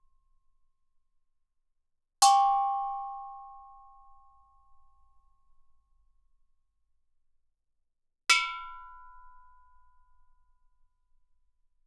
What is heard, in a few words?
Gamelan; Propeller Island - Complete Gamelan